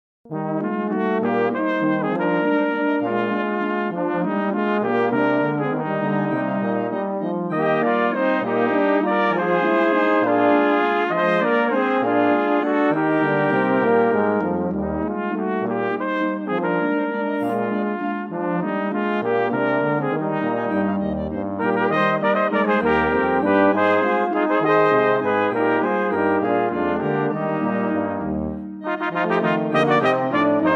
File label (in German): Walzer